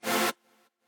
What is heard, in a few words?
chord